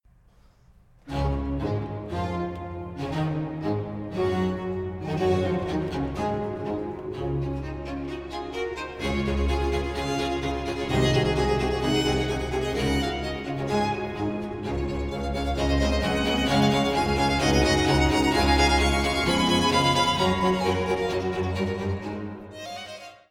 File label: D major for 2 violins and basso continuo Allegro